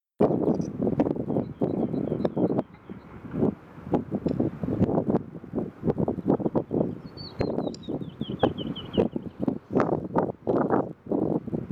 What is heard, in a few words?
canyon wren